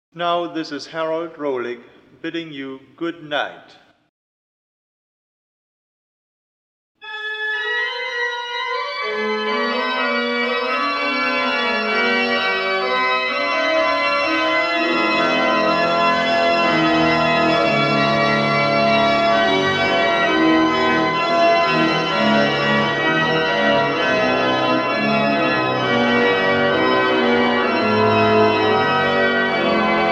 Improvisation

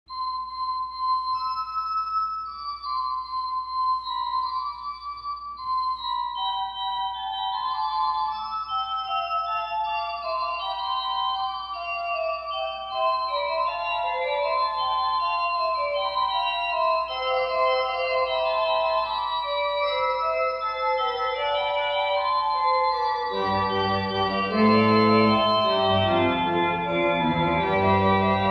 Little Prelude and Fugue in C Major Fugue; Bach

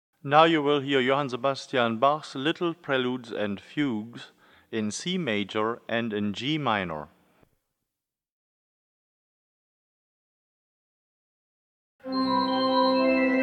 Little Prelude and Fugue in C Major Prelude; Bach; BWV 533

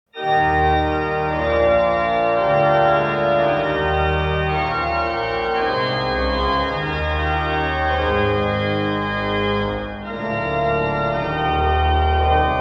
Chorale Preludes; Reger; 30 Short Chorale Preludes; 7 Salvation to Us Has Come

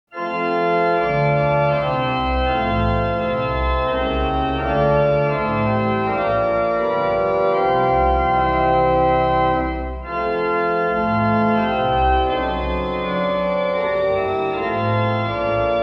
Chorale Preludes; Reger; 30 Short Chorale Preludes; 9 O My Soul Be Glad and Joyful

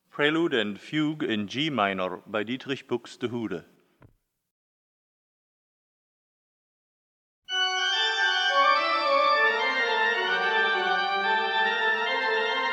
Prelude and Fugue in G minor Prelude; Buxtehude; BuxWV 149

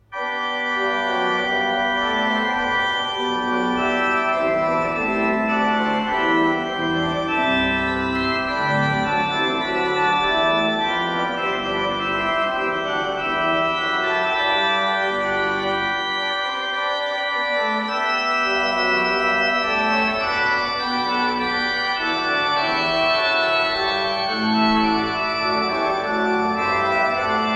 Orgelbuchlein BWV 623; Bach; Wir danken dir Herr Jesu Christe

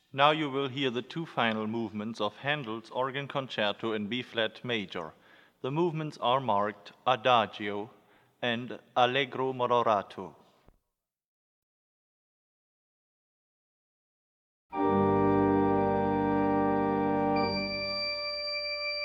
Organ Concerto No. 2 in B flat major Adagio; Handel; HWV 290; II Adagio

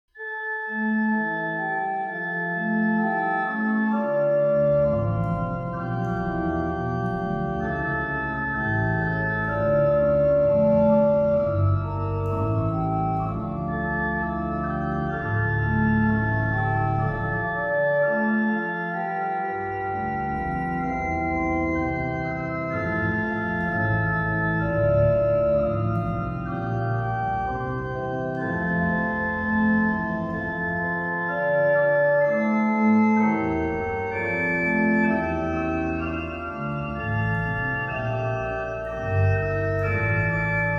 Orgelbuchlein BWV 636; Bach; Vater unser im Himmelreich

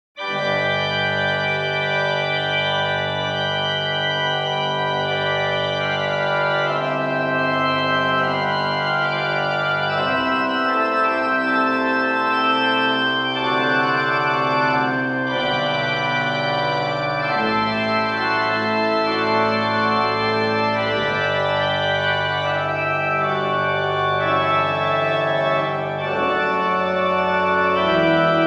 Prelude in G Major; Bach; Little Prelude and Fugue in G Major BWV 557

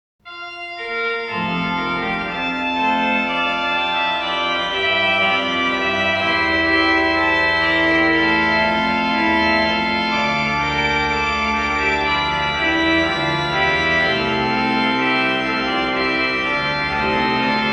Orgelbuchlein BWV 602; Bach; Praise be to God Almighty

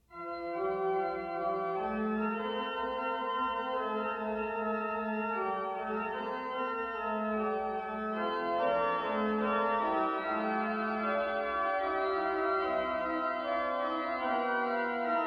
Fugue in F Major; Bach; Little Prelude and Fugue in F Major BWV 556

